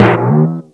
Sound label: bounce; hot potato